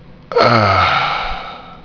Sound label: ahh